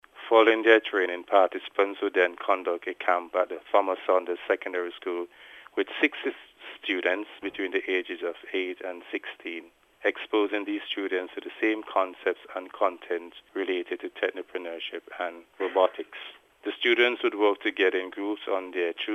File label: TECHNO future